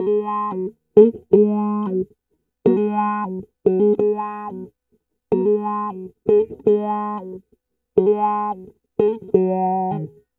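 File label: East Coast Hip Hop Wah Guitar Line Ab major; Digidesign; Pro Tools; Pro Tools Essential Loops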